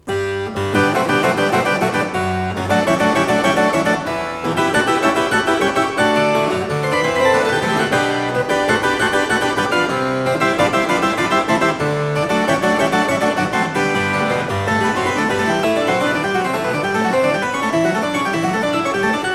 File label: Variatio 29 a 1 ovvero 2 Clav, Cembalo; BACH; Goldberg Variations